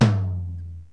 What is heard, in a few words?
tom